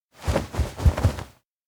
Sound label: head nod fast; anemoi